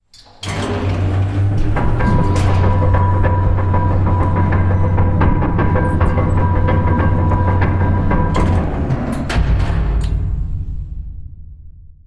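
Big Metal Chain With Lift Elevator Servo Ascending Up Or Down Movement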